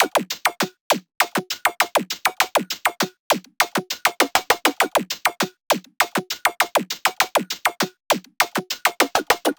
Drum Loop - 100 BPM Percussion; Midtempo; Deadlock; Cymatics; ANALOG EVOLUTION Beta

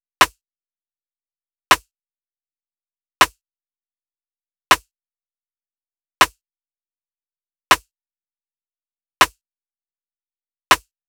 Drum Loop, 160 BPM Rim; Loop Stems; Cymatics; Vibes II Drum Loop Collection; Once

